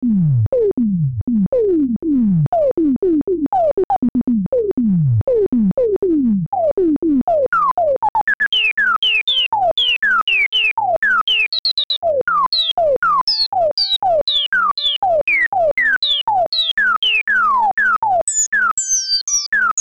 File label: imposcar vsti filter lpf q100; gmedia